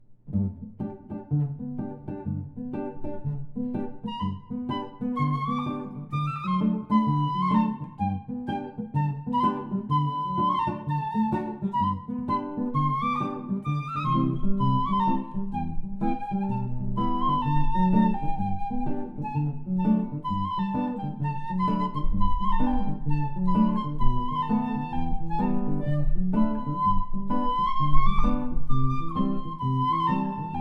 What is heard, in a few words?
Euroanthem, Flute; Flute and Guitar Reggae